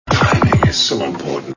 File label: tick